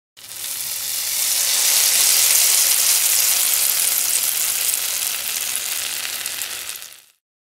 sizzling

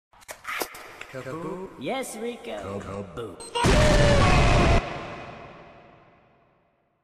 kaboom; noli

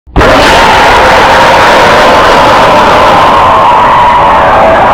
jumpscare; gray sprunki

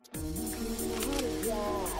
durururuuuuu

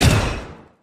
blaster; stormtrooper

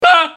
aahh

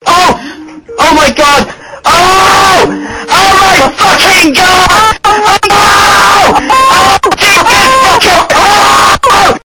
camera sound effects

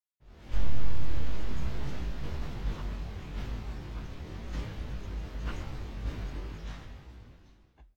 ambient rain

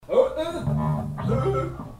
ahh ugh

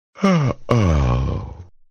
yawn

